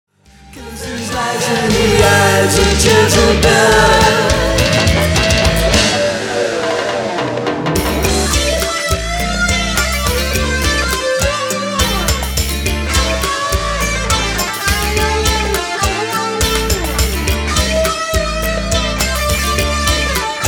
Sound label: GTR SOLO